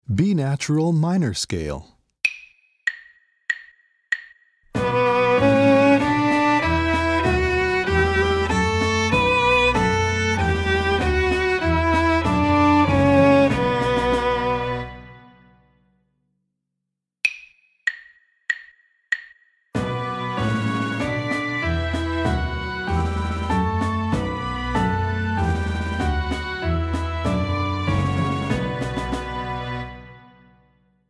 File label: B Natural Minor Scale